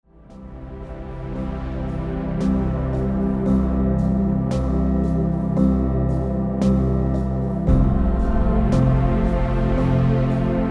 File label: pure music